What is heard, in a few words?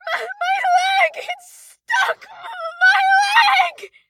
town human far; Blind Drive